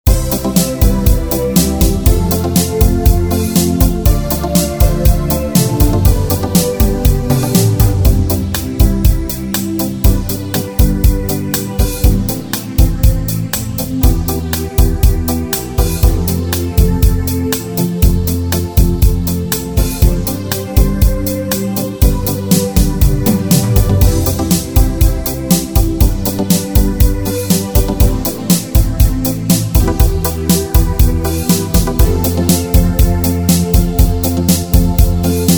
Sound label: Style Schlager; wersi